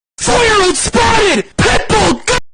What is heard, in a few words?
pitbull; 4 year old spotted pitbull